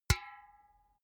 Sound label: empty can hit